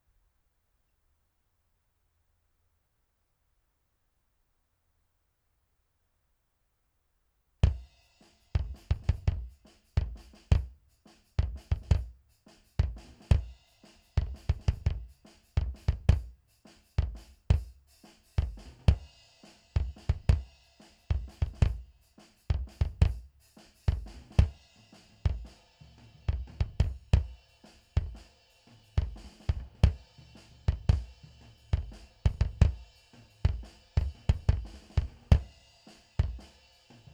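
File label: SAB BD1 mono Drums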